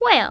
whale; The Interactive Alphabet

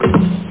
ball; Aminet 21; GTI - Schatztruhe

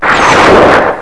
Rocket Flyby; Marathon revisited

